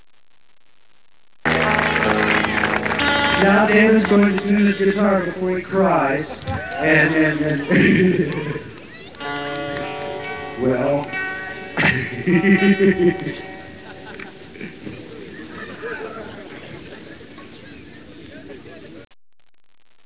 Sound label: laughs